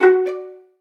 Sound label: Pizzicato, notifications; Samsung Galaxy A3 2017